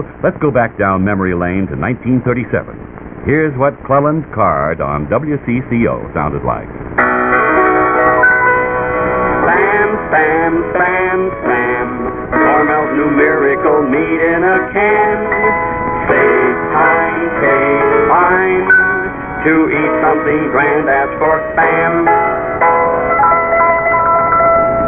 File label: jingle